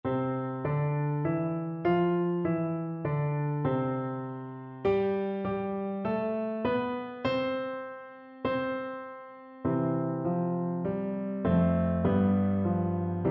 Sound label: unto, Flute